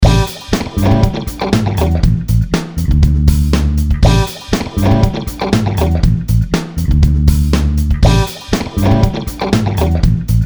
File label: psychedelic guitar